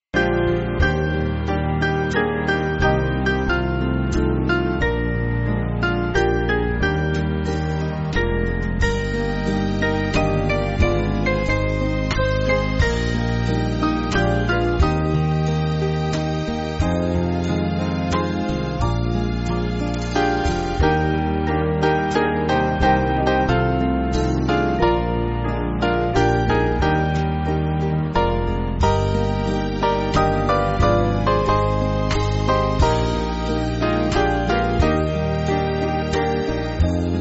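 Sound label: Band, Music Backing Files for Churches; Serenity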